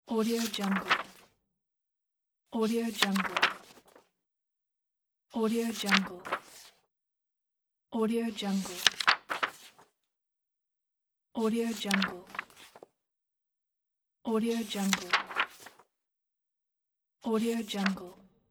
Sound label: small book pages turning